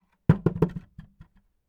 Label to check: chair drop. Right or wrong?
Right